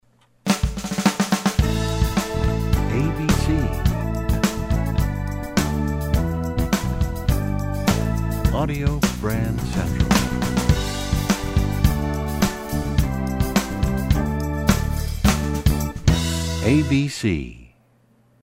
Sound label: American Country, radio jingles